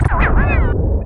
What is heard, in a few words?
ladder sound; SF; kakujitsu